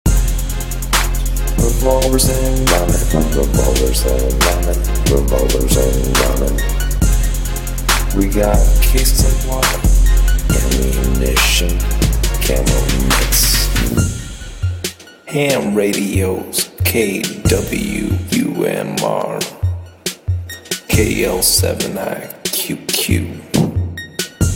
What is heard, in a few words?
zombie